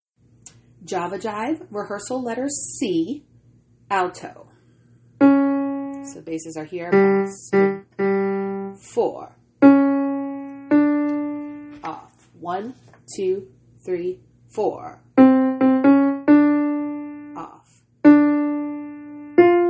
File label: alto